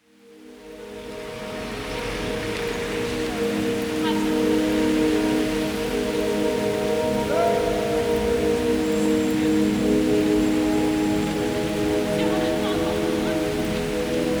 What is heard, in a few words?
Drumfunk